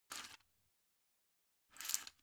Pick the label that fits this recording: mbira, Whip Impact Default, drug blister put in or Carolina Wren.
drug blister put in